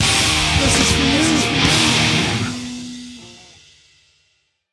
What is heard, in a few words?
big Win End